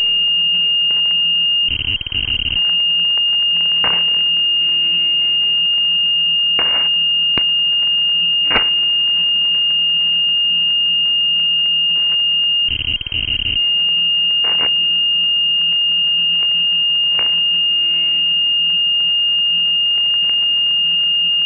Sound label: lux effect